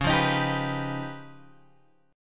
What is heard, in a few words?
Db 9th Flat 5th, Guitar, Open G